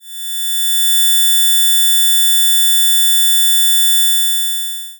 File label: Vn nonvib E5 mf foldover; foldover alla 7ma componente; La nostra fondamentale è 3520 Hz